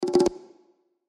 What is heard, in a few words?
Bongo Roll Fast Short; Drums; Blastwave